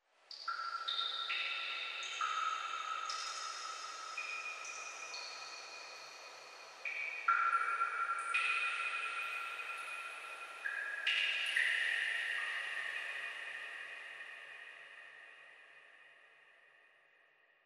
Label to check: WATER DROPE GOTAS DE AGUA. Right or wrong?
Right